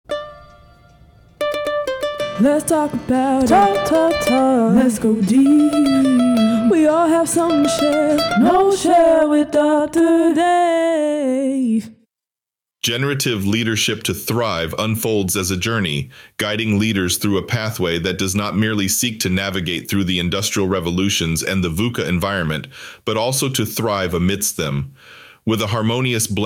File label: Jingle; Audio Book Blurb